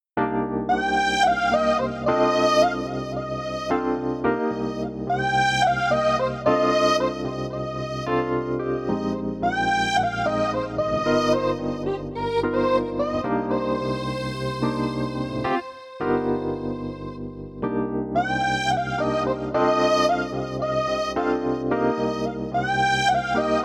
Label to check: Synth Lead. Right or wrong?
Right